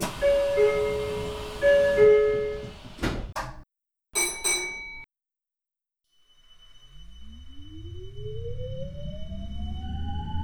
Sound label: motor noise; meitetsu 1700s